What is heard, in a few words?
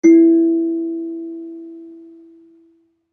kalimba circleskin E3 mf; Acoustic; HSS Acoustic Pack1